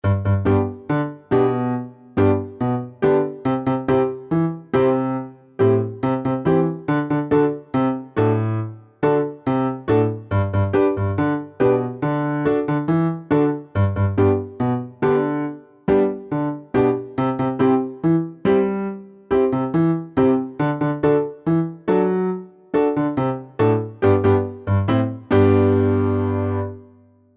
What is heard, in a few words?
Little Reggae